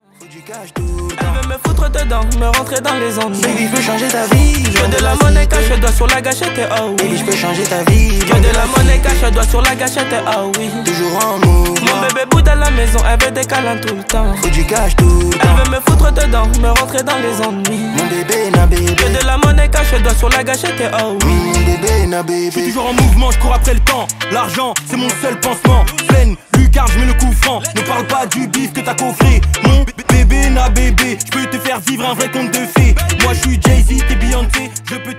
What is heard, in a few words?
hiphop